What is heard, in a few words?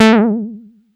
Bass Juno A; Vengeance Samples